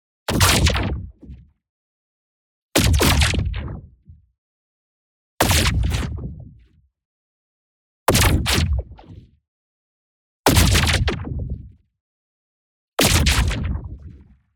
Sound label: IMPACT LARGE Energetic Construction; boom library; Matter